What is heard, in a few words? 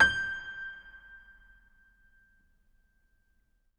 MF71 L, Acoustic Piano; InVision Interactive; Miroslav Vitous